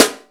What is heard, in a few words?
R-8 small s, Power Drums; Power Drums U.S.A